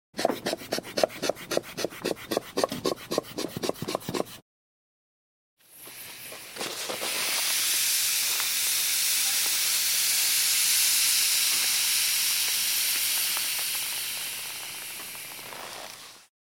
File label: Air Pumps Into Arm Band (Blood Pressure Cuff) Then Rele